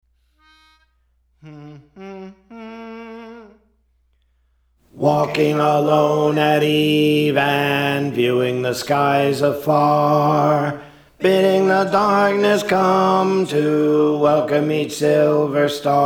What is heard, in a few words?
Alto; Praise For The Lord Training